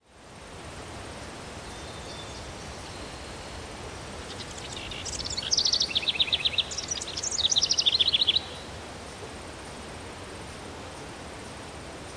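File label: Molokai Farm ambience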